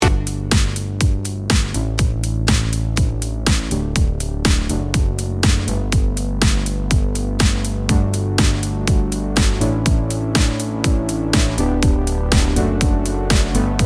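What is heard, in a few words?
warm poly synth; bluewave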